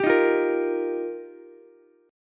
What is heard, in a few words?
F 7th Suspended 2nd Suspended 4th, down, Ukulele; F7sus2sus4; strummed; JGuitar